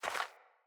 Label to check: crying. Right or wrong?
Wrong